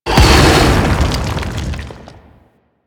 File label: Rock Elemental Explosion; Warframe; Brawler